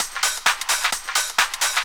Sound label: drum 130, techno trance drumloops; Techno Trance Essentials